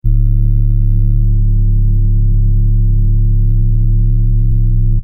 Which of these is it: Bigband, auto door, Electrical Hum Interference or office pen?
Electrical Hum Interference